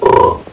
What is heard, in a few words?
Bullfrog Sound